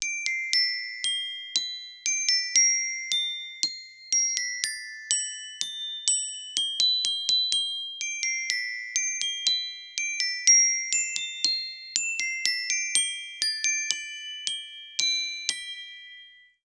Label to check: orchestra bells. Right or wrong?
Right